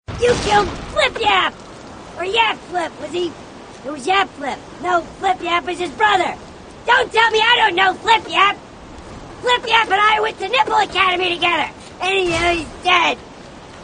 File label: grunt; halo 3